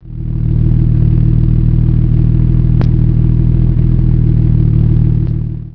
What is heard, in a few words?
mk2 idle, classic car